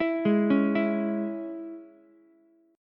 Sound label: Ab Suspended 4th Sharp 5th chord, Ukulele; arpeggiated; JGuitar